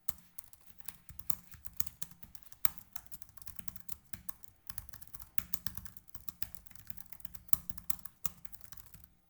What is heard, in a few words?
keyboard; NUC9 Extreme